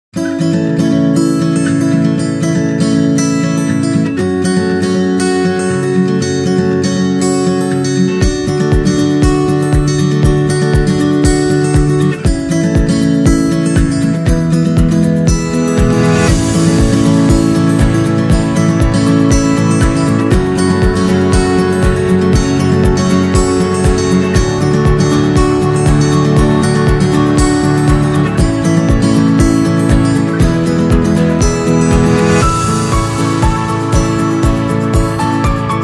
Romantic Guitar; happy music vol 8

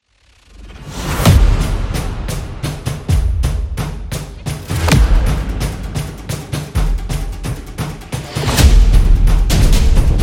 Cinematic Drums War; tribe

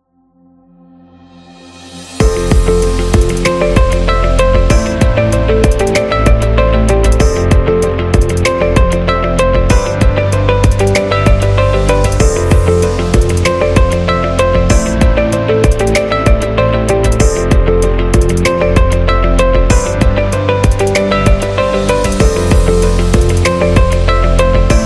Corporate Inspiration

